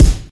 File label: boom bap hip hop kick drum one shot g key